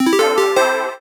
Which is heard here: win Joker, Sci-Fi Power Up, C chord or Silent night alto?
win Joker